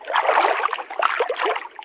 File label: water trough; Tradeskills